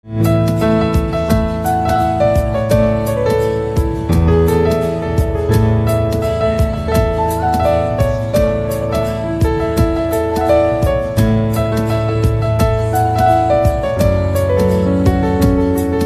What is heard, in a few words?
Piano Instrumental Ringtone